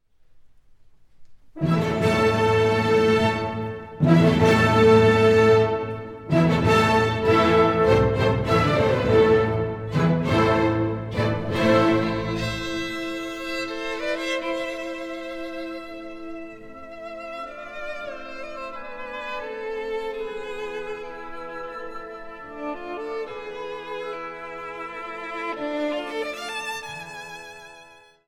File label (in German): Dvorak Violinenkonzert